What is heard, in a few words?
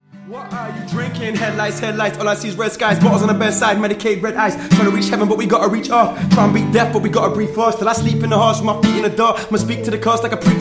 headlights acoustic